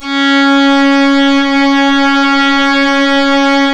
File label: C#, strings; Big Fish Audio